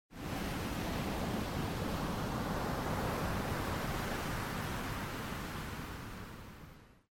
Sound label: Ocean; Age of Empires III; cinematics